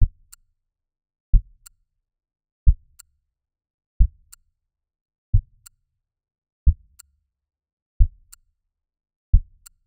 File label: Metallic aortic valve